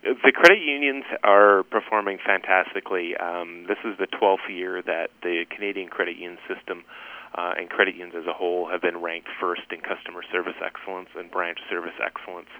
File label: Tom